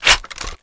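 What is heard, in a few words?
weapon foley m14 reload clipin tac; Call of Duty Modern Warfare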